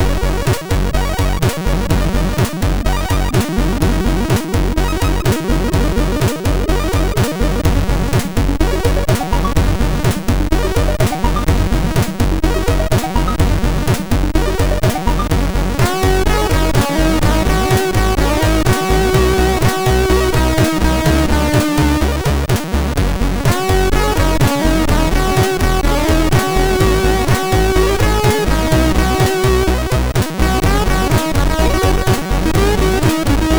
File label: Cracking Sweet, MOS6581R4